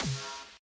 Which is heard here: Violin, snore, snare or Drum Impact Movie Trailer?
snare